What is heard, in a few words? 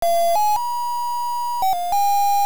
Ocarina Default; Play the Ocarina: default tune